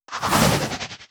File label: Big Buzzer Swoop; gamerip